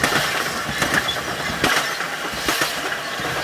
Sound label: Run, Train; OpenBVE; Cl313 Silverlink